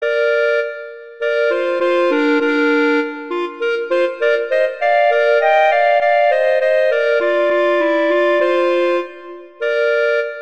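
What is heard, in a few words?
Duet, horn; Mozart Duets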